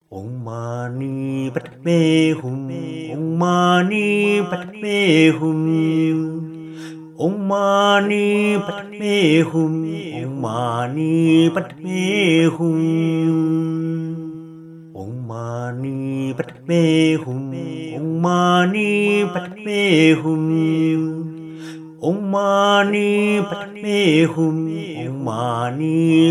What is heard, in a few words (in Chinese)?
六字大明咒(唱頌); 四臂觀音; 又稱觀世音菩薩心咒